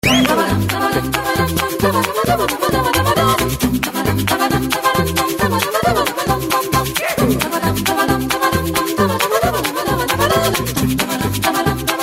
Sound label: Polka with Mr. Bass; SH240; Ringtones; Acappella; LG